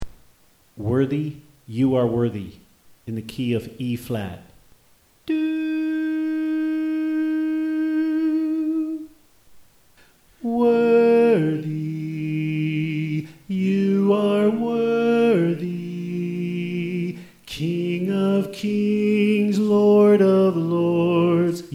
Soprano

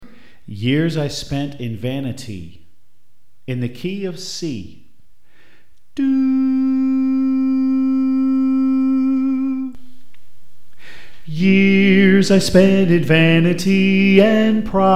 Soprano

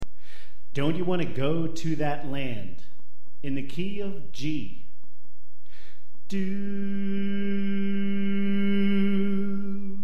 Soprano Left

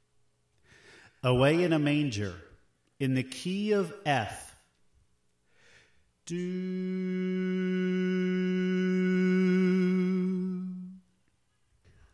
Tenor; Away In A Manger

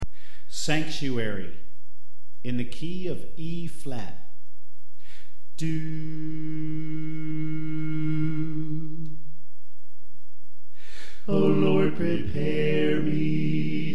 Alto Left

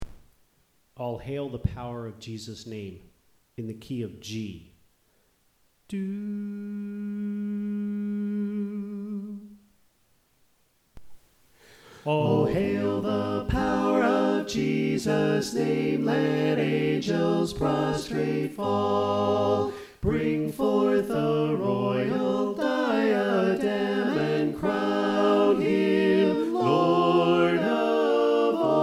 Soprano Le; All Hail The Power Of Jesus Name

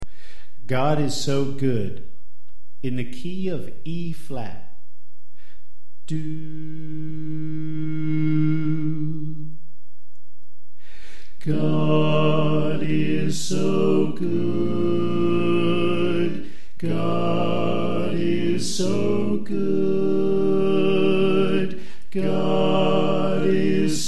Bass Left

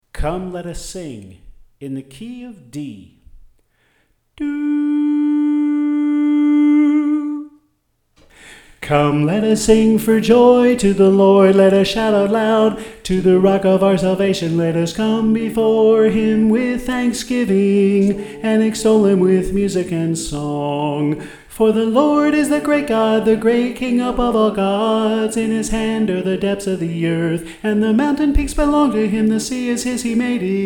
Soprano

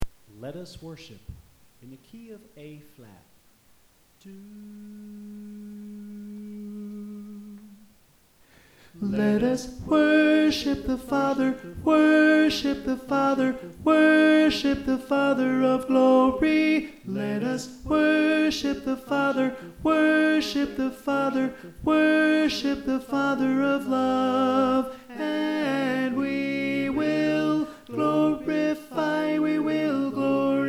Tenor

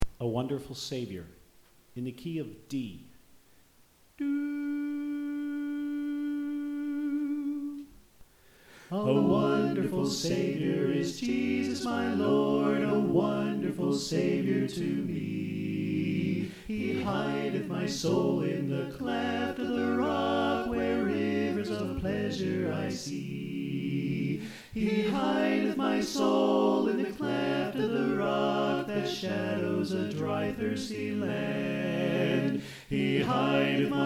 Alto Left; A Wonderful Savior

